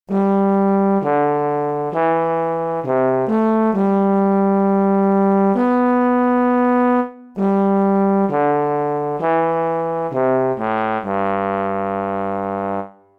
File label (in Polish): puzon; Nagrania Lokomotywy